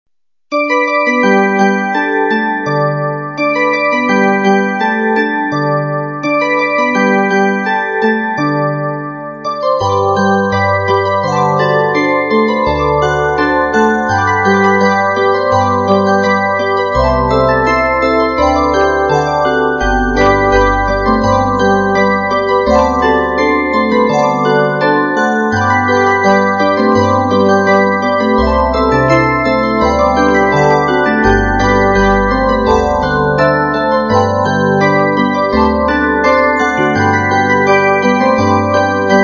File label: Magic Flute Glock